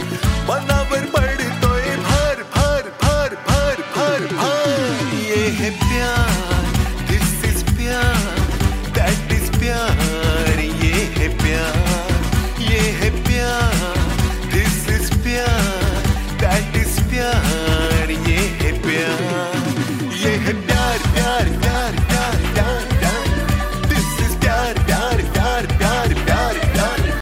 marathi ringtone

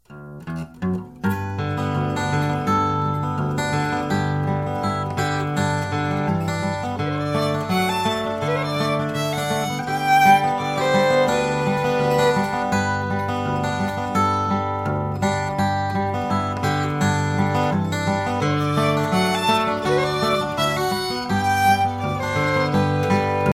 spanish fandango ringtone

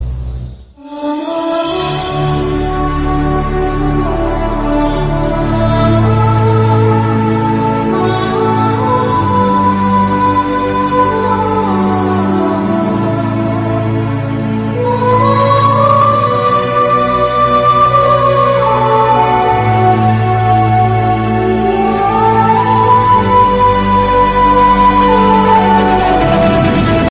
light romantic music english sad ringtone

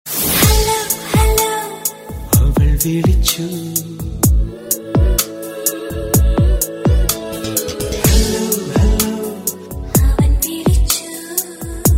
lovely bollywood tone ringtone

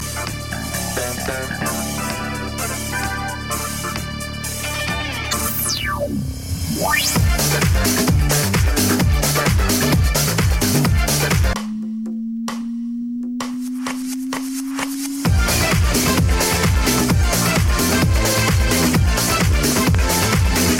classic mix ringtone

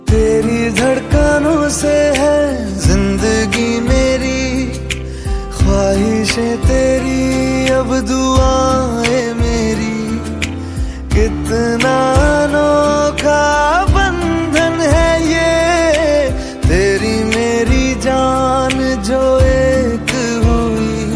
latest bollywood ringtone

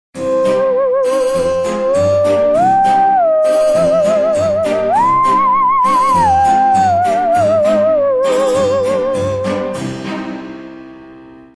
nokia lumia ringtone

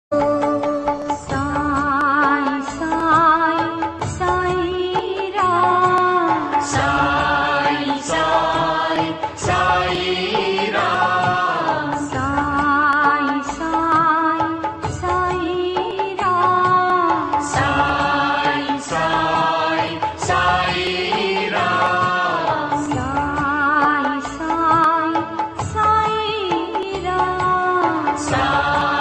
bhajan ringtone; saibaba

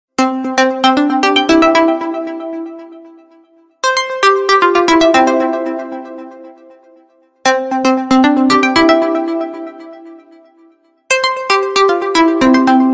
background music tone ringtone

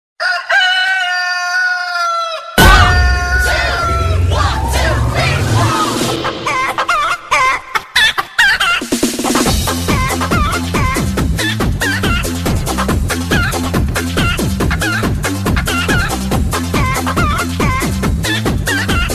chicken mix ringtone, funny

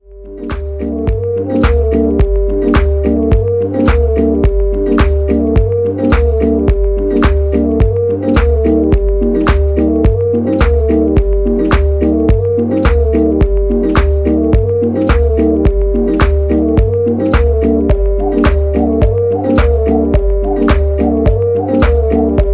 ringtone, samsung

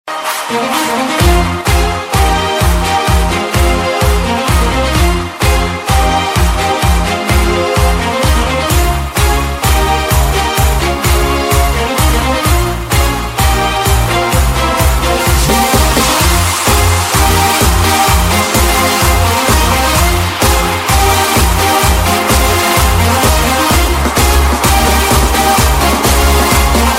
electro ringtone

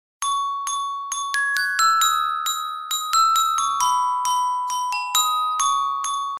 ding ding ringtone

